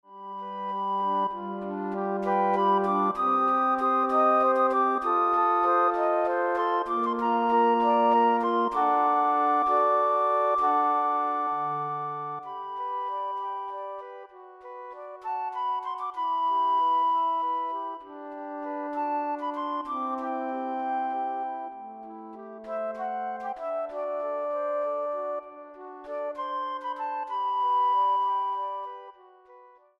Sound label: Flute Ensemble